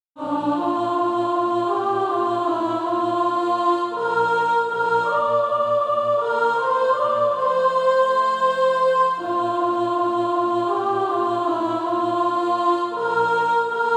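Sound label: soprano, Practise Music; SATB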